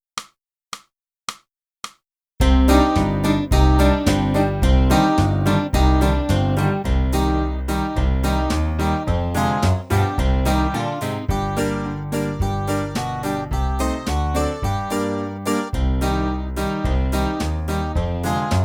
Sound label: flute, on the waves of lake; Boosey Woodwind Method: Flute (Book 2)